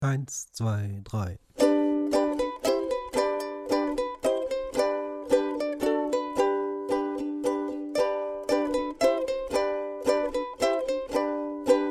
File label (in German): Kommet ihr Hirten, ukulele